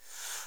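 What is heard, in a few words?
SMALL BREATH, MALE; Northstar - New Gold VOL-2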